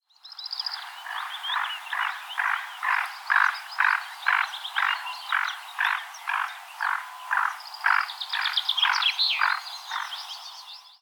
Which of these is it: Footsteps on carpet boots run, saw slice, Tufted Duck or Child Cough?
Tufted Duck